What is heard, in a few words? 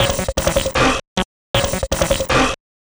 Arp funky, Keyboards